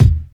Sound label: tight kick sample e key, steel kick drum sample; kick drum samples